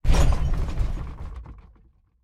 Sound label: Monster Hook; MiSide